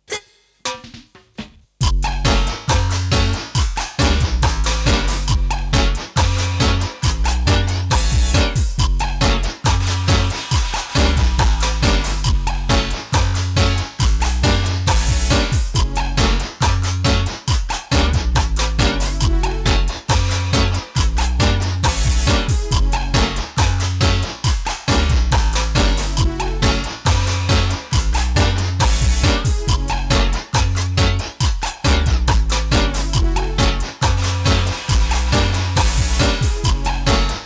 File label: appliance dub mix